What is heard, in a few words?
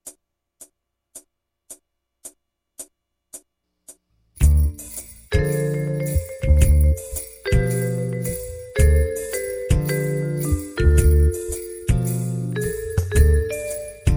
Funky Searching with Vibes